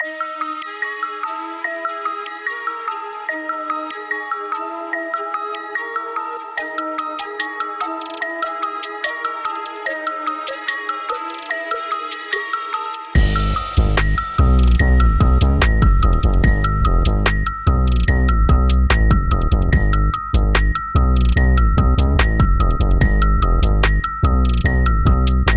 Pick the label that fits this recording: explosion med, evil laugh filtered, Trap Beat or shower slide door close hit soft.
Trap Beat